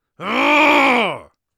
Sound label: angry, Warrior Voice; Warrior Voice Pack